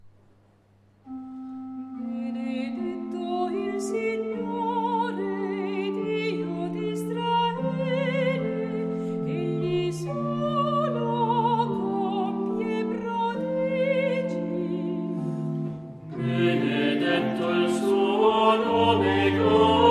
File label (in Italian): Salmello; Sabato; Veglia; dopo la V lettura; servizio per la pastorale liturgica